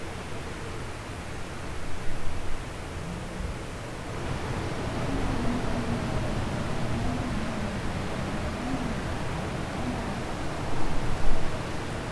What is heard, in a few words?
winter, ambiance